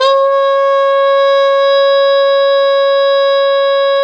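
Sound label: brass plunger trumpet, plunger mute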